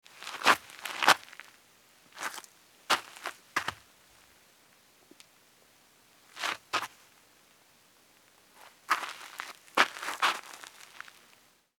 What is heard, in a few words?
Footsteps Sneaker Dirt Scuff